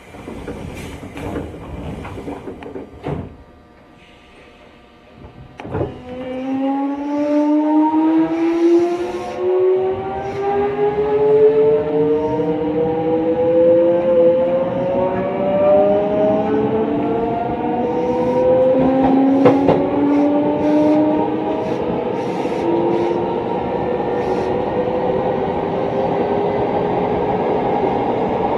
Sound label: vvvf, mitsubishi; odakyu 1000